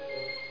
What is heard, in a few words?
Country holiday popup; desktop themes; x-mas